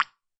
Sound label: ultimate jaw harp